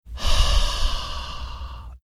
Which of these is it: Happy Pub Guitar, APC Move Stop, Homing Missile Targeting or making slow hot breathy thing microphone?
making slow hot breathy thing microphone